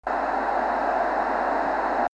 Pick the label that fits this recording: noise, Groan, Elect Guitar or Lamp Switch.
noise